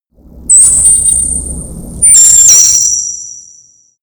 space machine alien ring high

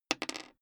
die roll table

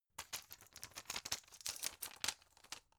plastic blister handle

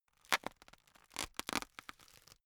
plastic pressured rustling slow

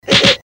eastern fist hit seq part1

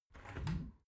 window open regular

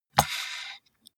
computer mouse draw drop hard

